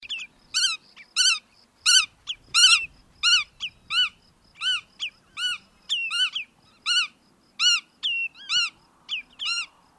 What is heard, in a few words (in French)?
barge a queue noire